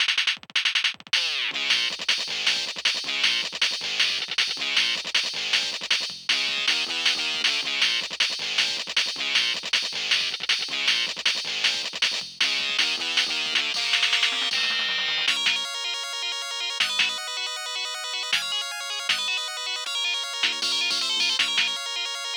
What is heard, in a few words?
Aircheck, Cheap